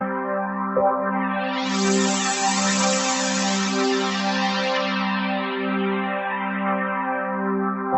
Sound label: melody